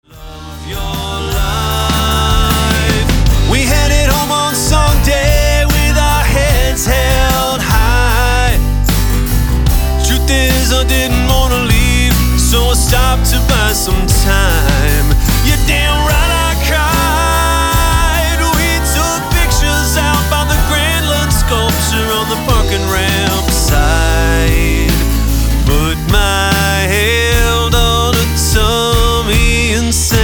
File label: rock jam band